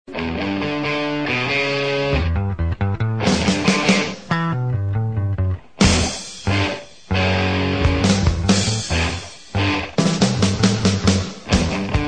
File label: licks solo